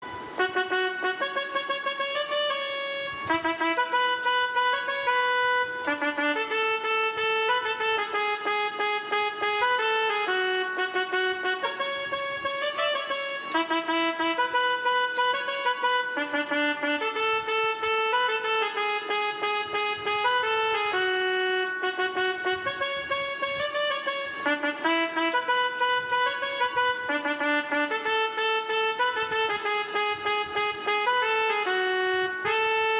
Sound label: instrumental